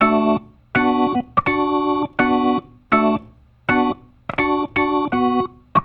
Soul Organ Riff, 70s Analog Funk

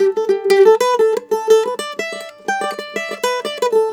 MANDOL 120 G; USB Soundscan vol.42; Celtic Flavours; AKAI

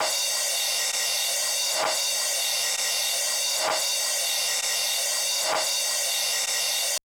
kawai k1 CRASH ALT; wavetables

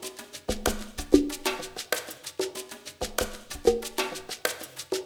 ABO PERC R; Spectrasonics

